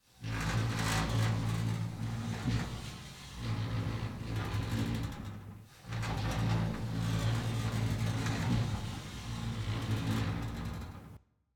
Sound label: Sci Fi Metalic Groan